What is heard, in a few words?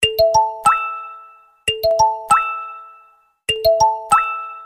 samsung note10 sms